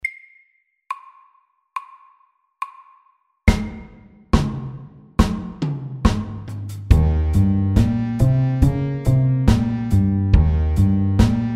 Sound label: Walking bass SLOW 70